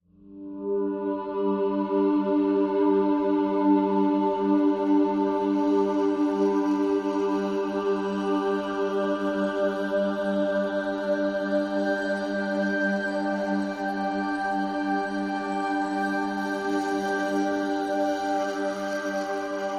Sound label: Natural Element G, No Flute